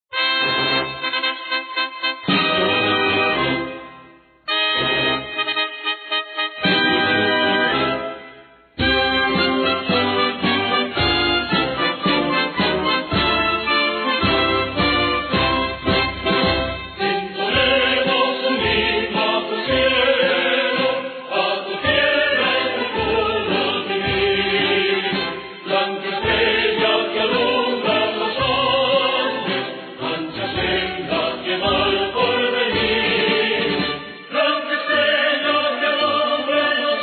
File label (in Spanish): himno bogota coro